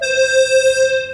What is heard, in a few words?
Synth Piano C5; 轻音少女